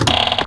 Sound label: Door Ghost House; New Super Mario Bros. Wii